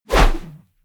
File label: whoosh air